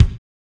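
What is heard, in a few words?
Kawai ACR-20 bass drum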